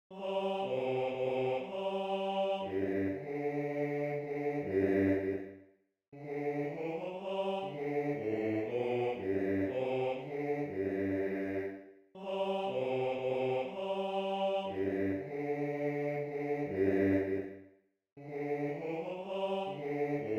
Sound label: Bass II; Bauernmesse; 5. Sanktus